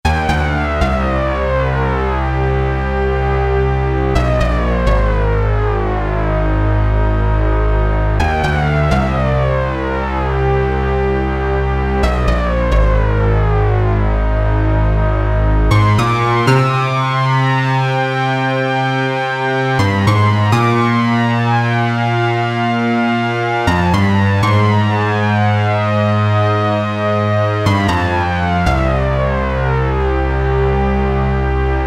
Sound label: JD-990 laser harp